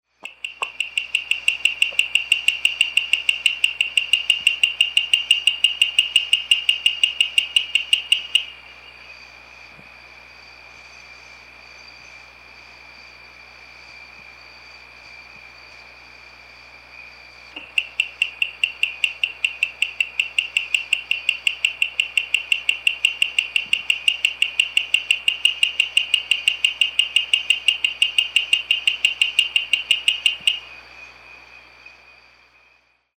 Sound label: Sphaenorhynchus caramaschii